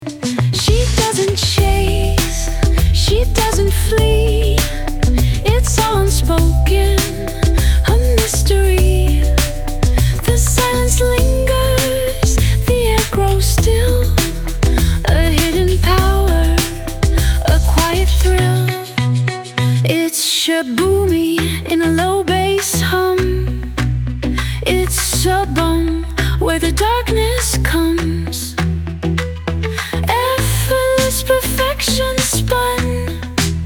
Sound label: Low Bass Hum